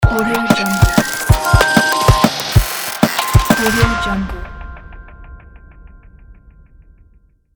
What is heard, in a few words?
technology glitch logo